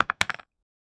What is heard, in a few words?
deep plastic snap firm, lego